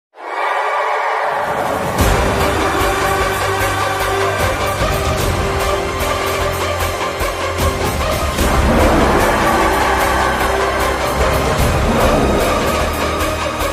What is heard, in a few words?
tatoo bgm ringtone; salaar